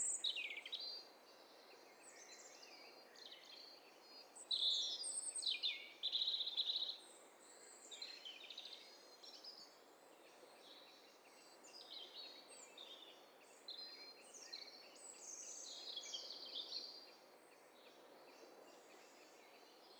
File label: STEREO BIRD COCKTAIL PARTY